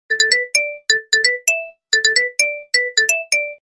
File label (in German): Lustige Stimmungs SMS